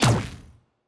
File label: Weapon Shoot